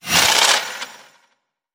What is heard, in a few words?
Get Energy; ProjectZ